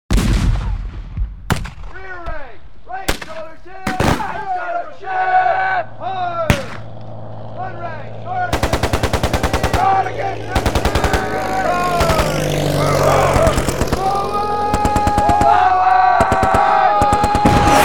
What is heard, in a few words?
warfare